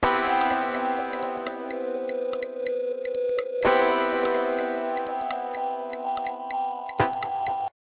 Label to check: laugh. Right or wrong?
Wrong